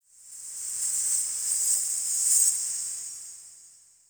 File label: Bell Jingle High C, Crypt Ambience; One Offs; Champion Crypt